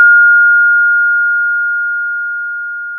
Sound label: Sound of a school bell